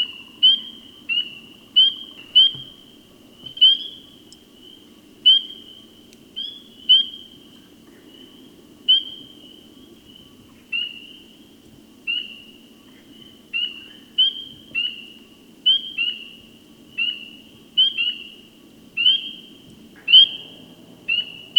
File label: spring peeper; amphibians; terrestrial